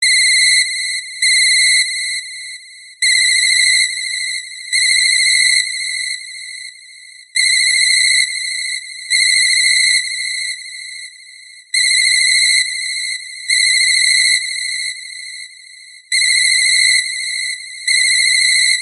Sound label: VIVO Y15 ORIGINAL RINGTONE